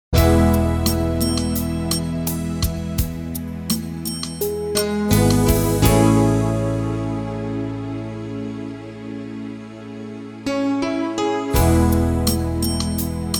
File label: Genre Pop